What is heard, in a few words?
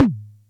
Music Percussion Computer Tom, Drum Machines; Atlantex MPC-1